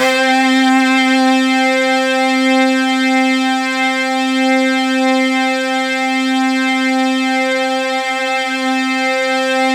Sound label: C4, Synth Line; 90 s Sample CDs; NiceBeats; SynthLine VOL-26